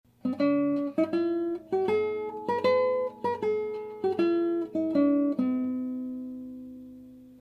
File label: dotted, classical guitar